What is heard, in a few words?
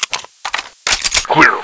reload